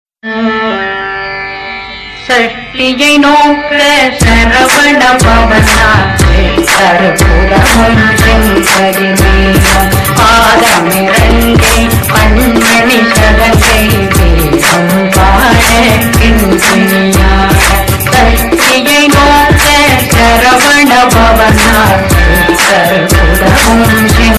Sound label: bakthi remix ringtone